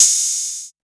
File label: Open Hihat, Techno Drum Shots; Ghosthack; Free Techno Drum Shots 2024